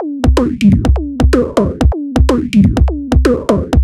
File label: Robodol 125bpm, House Drumloops; Zero-G House Fabrik; 90 s Sample CDs